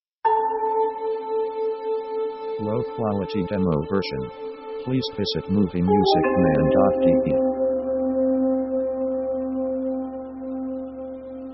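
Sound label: orchestra mystic retro; Dark mood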